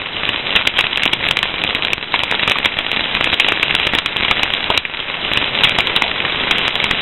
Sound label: Crackling Fire